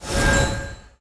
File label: reel win flash; godsofgold